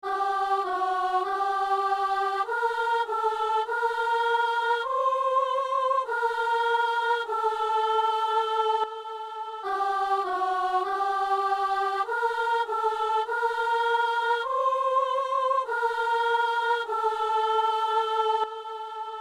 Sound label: soprano; Messe du 17 janvier à Saint-Denis; Doxologie du canon; messe de san lorenzo